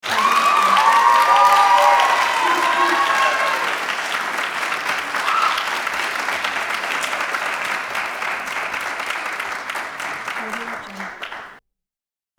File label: surprise guest applause